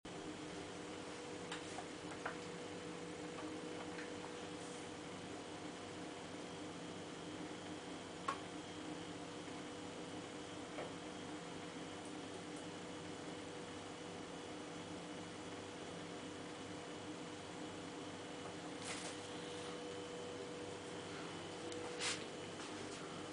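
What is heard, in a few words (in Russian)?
popugaj korella golos, cockatiel parrot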